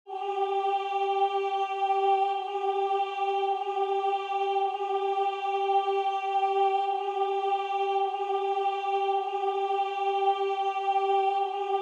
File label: Gloria Alto; Vivaldi; Antonio Vivaldi